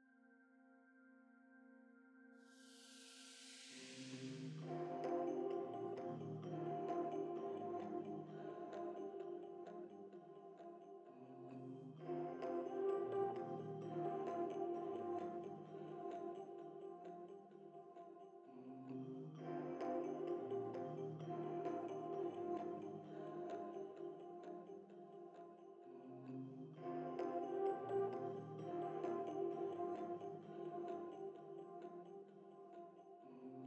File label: Main Reverb & Delay; risk of rain 2 engineer edition 2